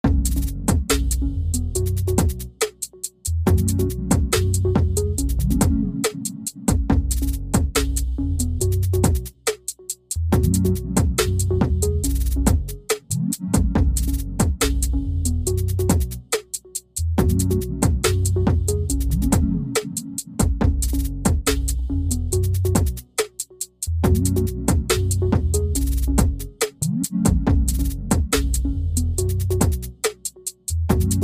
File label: Thrumming Waves; spring pikes